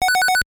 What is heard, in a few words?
Pause SFX; Duck Hunt